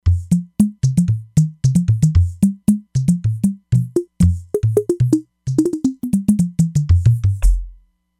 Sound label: Titse Drum, E-mu Proteus-3; Factory patches